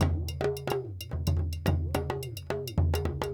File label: Djoun, World Percussion